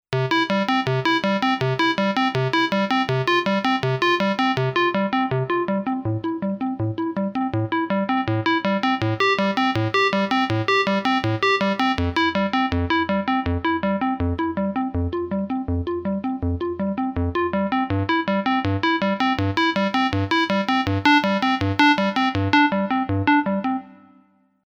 korg m1r synth

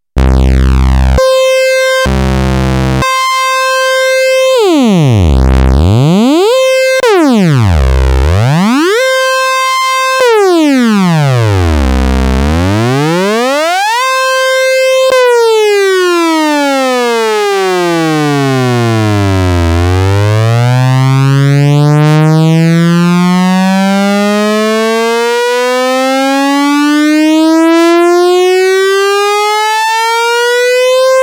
test glide, Moog minitaur; analog monophonic synthesizer; 2 VCO oscillator

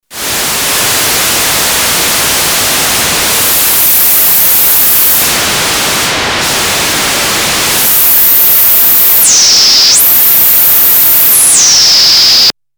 noise, novation bass station 2

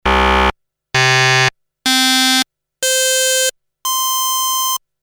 generated cycled wave, harmonic synth, Korg DSS-1; main core based on 8 bits CPU Intel 8085 manages 8 voices polyphony; Created waves can be saved as normal samples

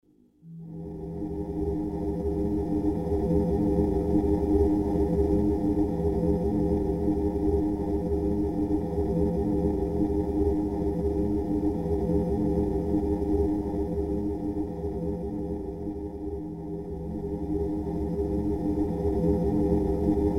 ambient 4 bits, kawai k1r

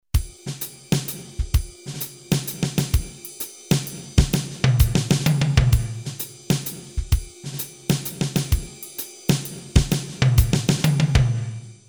drum acoustic, Roland JV2080

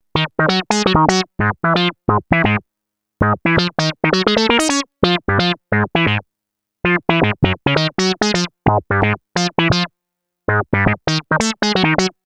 synth wet, novation bass station rack; Synth: wet resonance

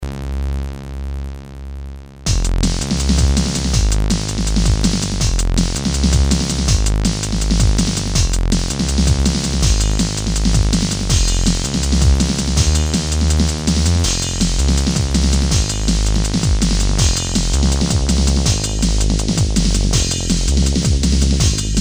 seq, korg ms20 synth

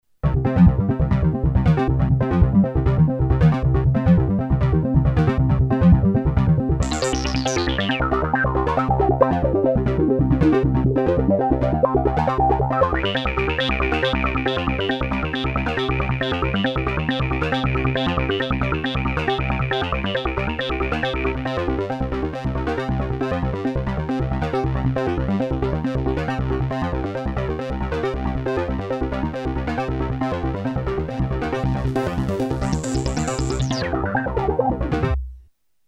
seq, redsound darkstar

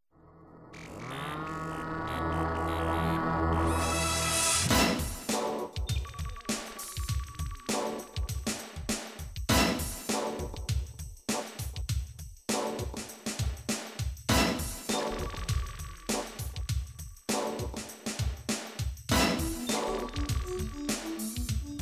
yamaha, yamaha tg500

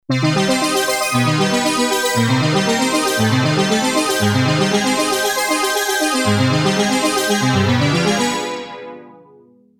synth digital, akai s2000

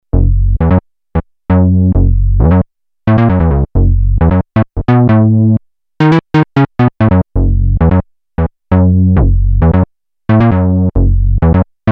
synth soft square, Moog Minitaur

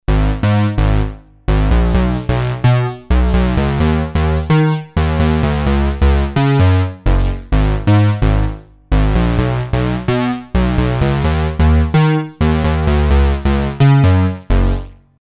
bass, Bass: moogish, Roland JV2080

